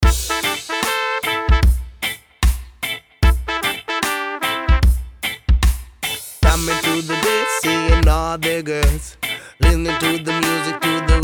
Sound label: reggae